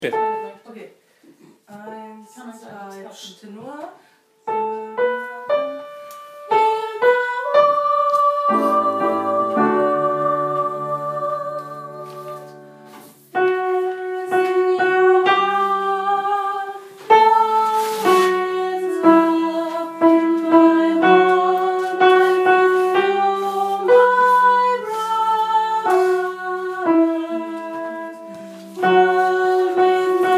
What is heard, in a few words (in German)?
Sopran